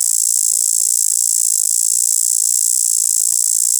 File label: cicada